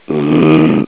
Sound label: flatule